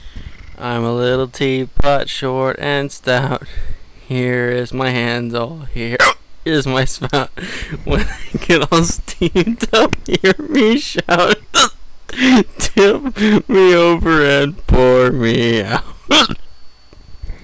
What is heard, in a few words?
Hiccups